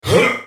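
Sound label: spellcast effort; Jayce Sentinel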